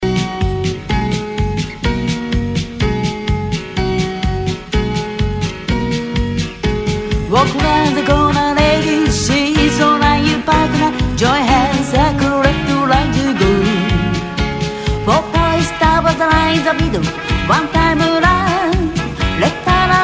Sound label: vocal